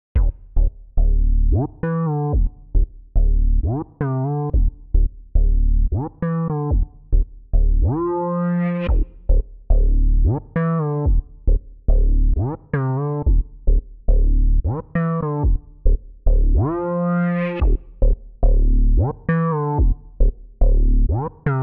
Dirty Solo

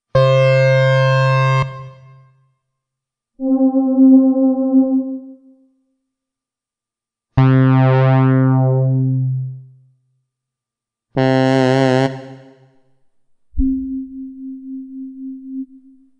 Tape

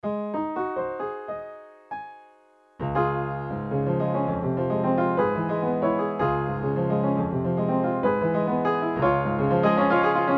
V-Piano

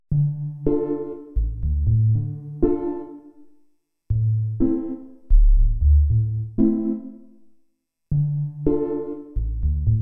Trance Anthem

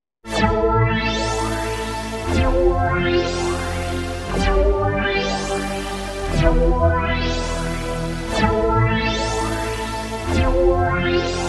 Inverted Envelope

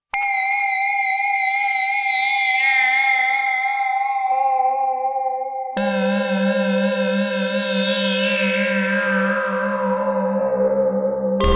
Drops Like Chimes

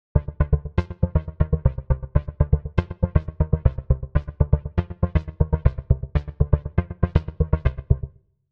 Juno Blips, Roland JU-06; SoundDiviner Project; Roland JU-06 Pack10

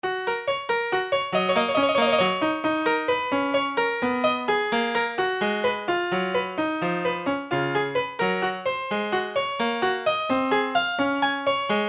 V-Piano

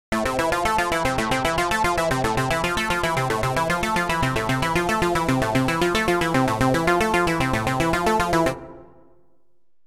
Blip

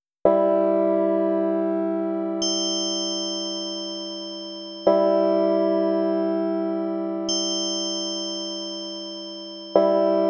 Bells

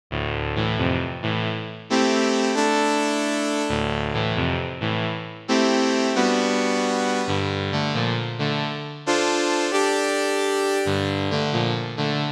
Synth 5th

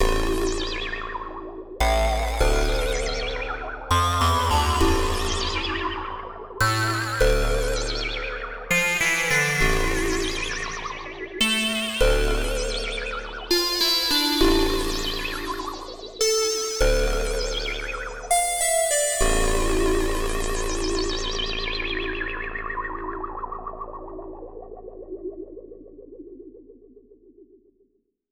Digi Scifi

